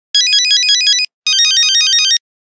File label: Intro, Nokia Buzzer; Windows Phone apps